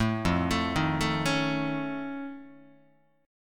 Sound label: F Augmented 7th F Guitar, chord; F+7 arpeggiated; JGuitar